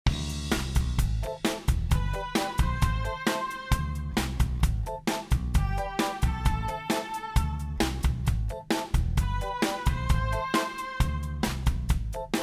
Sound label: Funk